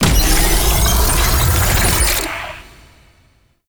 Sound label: Charging Rush Impact; Warframe; Infested Lich; Hard Drive